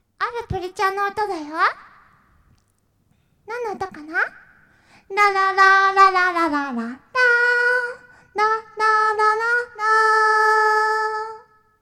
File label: karaoke